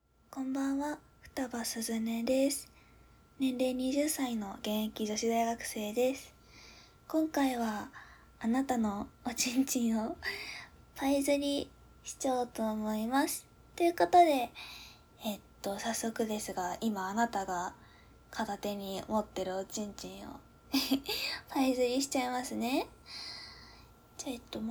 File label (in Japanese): ローションパイズリ